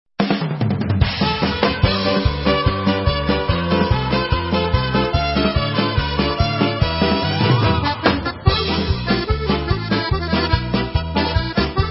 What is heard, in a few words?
Instrumental